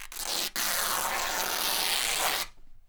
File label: Canvas Bag Rips